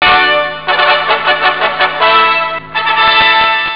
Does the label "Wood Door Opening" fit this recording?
No